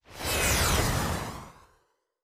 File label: Weapon Shoot (Rocket Launcher); Sci-Fi Sounds